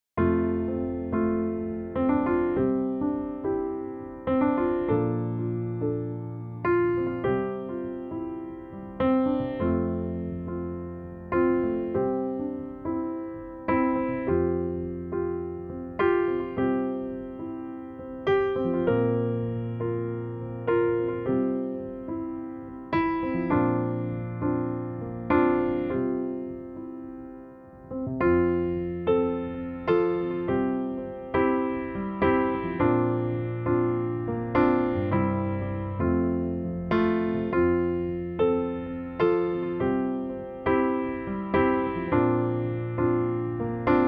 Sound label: Backing; jardim infancia